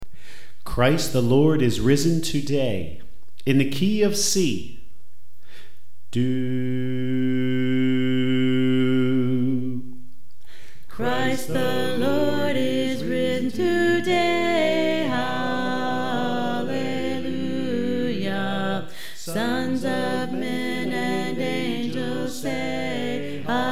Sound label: Alto; Christ The Lord Is Risen Today